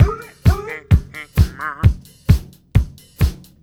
BEAT, Roots Disco; USB Soundscan vol.32; AKAI

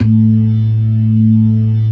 ORGAN LOWER MANUAL; Keith Emerson Signature Series

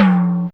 Timbale, Fairlight CMI IIe, Percussn

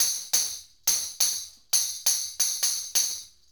Tambourine

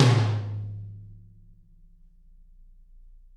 Toms, Drums; Bob Clearmountain Drums I

